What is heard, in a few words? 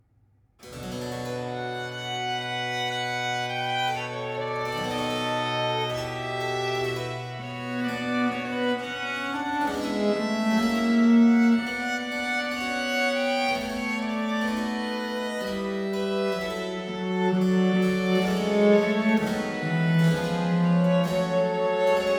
Sonata IX in C minor Z 798 Allegro; Complete Chamber Music; Brilliant Classics; Twelve Sonatas of three parts (1683)